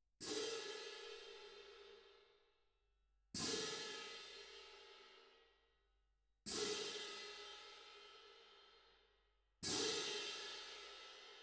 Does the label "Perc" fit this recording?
Yes